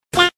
cut sound

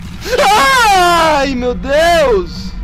aaaah

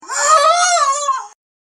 arghhh

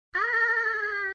hahahaha

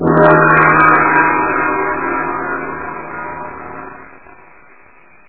gong; ibuttons

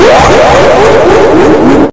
vroom vroom vroom

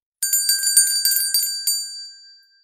handbell

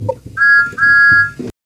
whistle

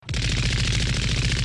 vulcan machine gun sound; gundam

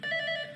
buzz

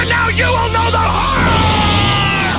the horror

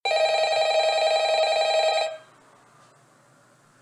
phone call sound

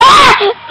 kid screaming

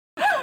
gasp

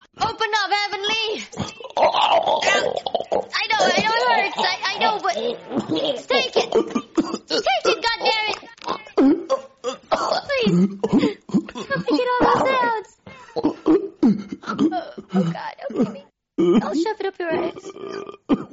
sus af noises

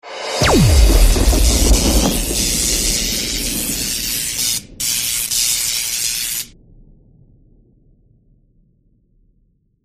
magic thunder strike

